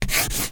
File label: pencil writing; General